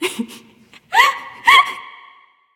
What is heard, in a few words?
laugh; Smite; caster